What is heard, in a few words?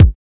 Kick